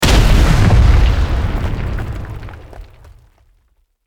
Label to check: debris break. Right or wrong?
Right